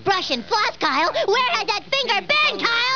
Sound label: brush